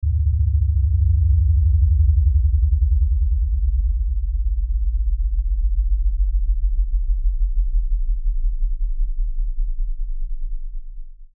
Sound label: Lfe Descend Low Bass; Descending